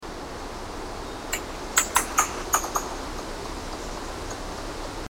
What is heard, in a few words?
Eurasian red squirrel Sciurus vulgaris; Awenne